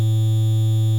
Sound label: saw 110